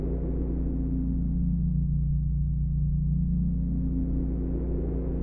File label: moving shield sound